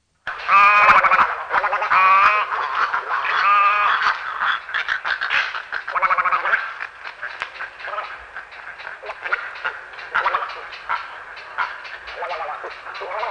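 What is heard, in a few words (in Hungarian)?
Kis kócsag; Madarak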